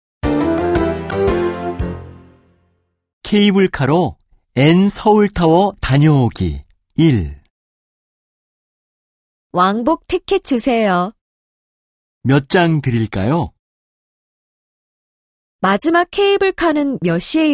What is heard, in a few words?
K-POP